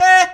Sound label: Synth Voc; Synth Shots; Vengeance Samples